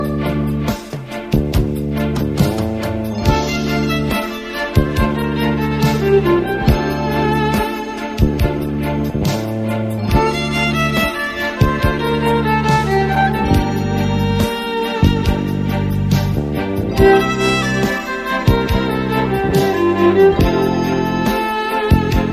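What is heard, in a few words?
violin Azeri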